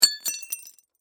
Crash Glass Drop, Crash, Glass, Drop, Small, Piece, Debris; Sound Effects:Materials